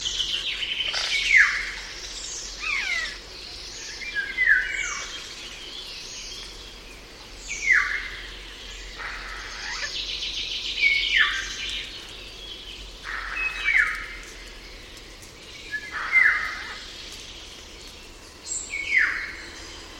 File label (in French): loriot d europe; chant